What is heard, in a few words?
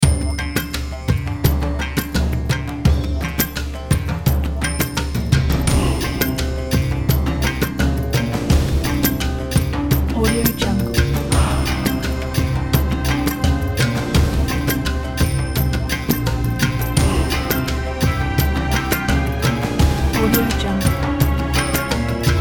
arabian caravan